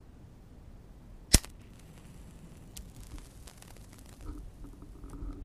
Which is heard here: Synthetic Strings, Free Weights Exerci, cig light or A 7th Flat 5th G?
cig light